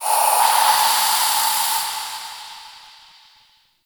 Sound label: Zariman Steam Blast; Warframe; The New War; Ambience